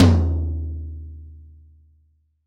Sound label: TOM, CTD TOM B